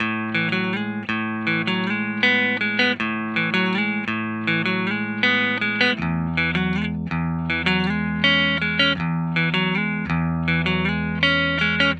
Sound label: 80 Dry Guitar Riff, Dream Pop Guitar; Romance; Guitar Hypnosis